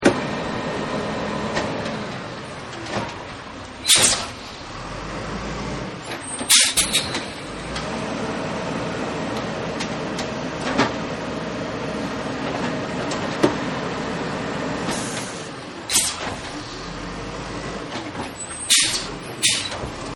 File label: Garbage Truck; Trucks; Vehicles; Partners In Rhyme